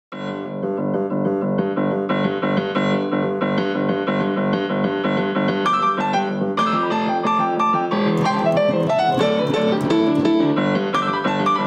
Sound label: 70s Rock Piano